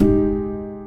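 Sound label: PIANO M7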